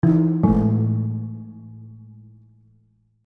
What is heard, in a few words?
You Lose; Ezaron Chronicles